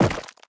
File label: Dung Ball Death